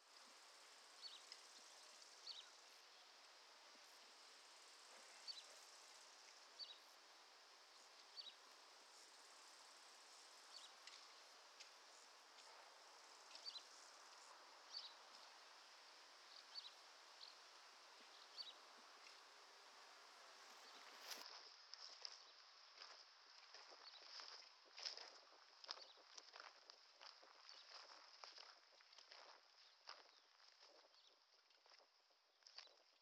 Pas Gravier Oiseaux, Friche Industrielle Rhinau

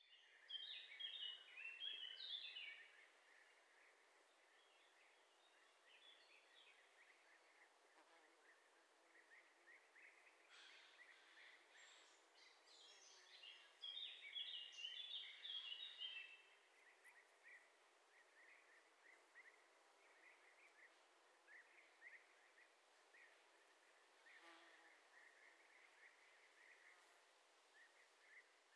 Très Ancienne Carrière Lac Friche Bord Forêt Oiseaux Grenouilles

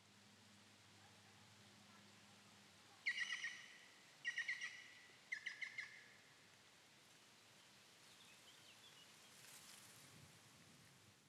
Très Ancienne Carrière Lac, Oiseaux Tututut Discussion Enfant Vent

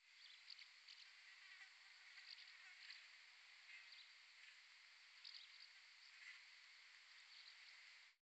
Friche Industrielle Petite Abeille Et Pelle; Rhinau

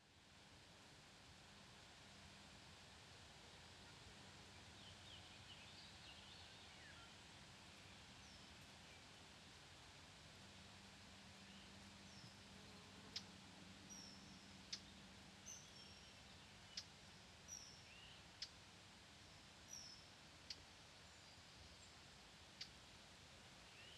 Très Ancienne Carrière Lac, Oiseaux Et Avion Au Loin Et Plouf

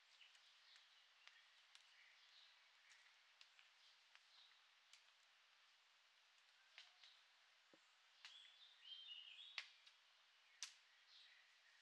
Très Ancienne Carrière, Oiseaux Brindilles Bâton

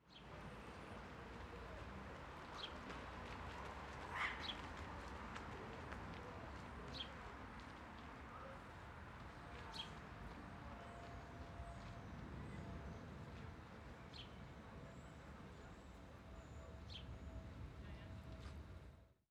Vent Discussion Inaudible Course Pied Gravier Oiseau; Petite Ceinture

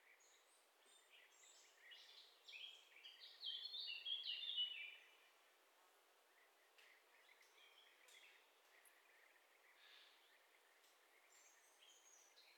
Très Ancienne Carrière Lac, Friche Bord Forêt Oiseaux Brindilles Pic Au Loin

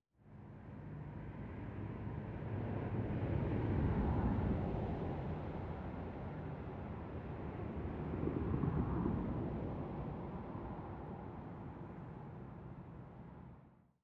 Bord De Route Allemagne Passage Voiture

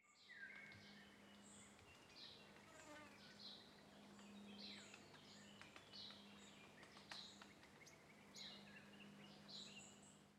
Très Ancienne Carrière Avion Oiseaux Et Battement Ailes

